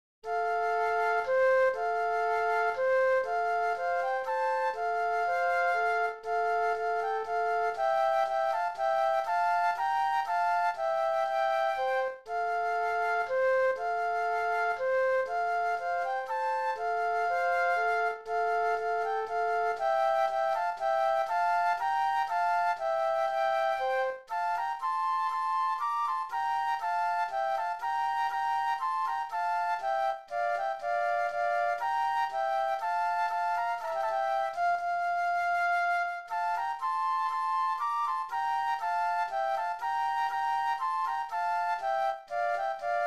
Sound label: Minuet Flute Duet; classical; Handel; no. 2 HWV 351